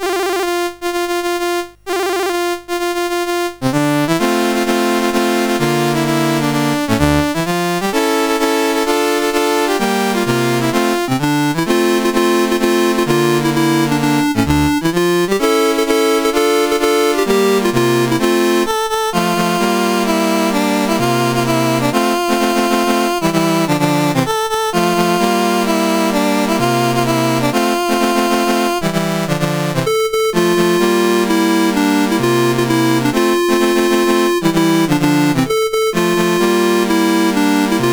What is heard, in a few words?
Algeria National Anthems, CSG8580R5